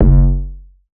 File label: 808 one shot F#; Slate Digital BASSHOLE Sample Pack